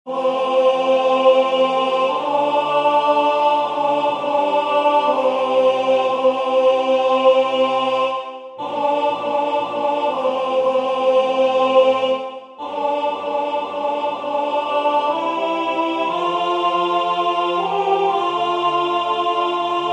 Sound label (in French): Ave Maria priez pour nous alto